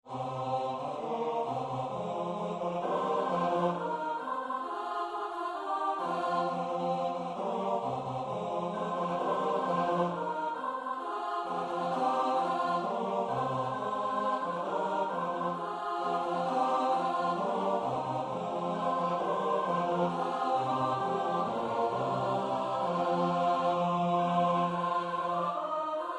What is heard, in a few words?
dese coxkens midi; ons musyckboexken 2016